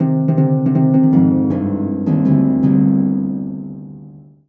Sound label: Solo Harp Negative; MUSIC EFFECT; startide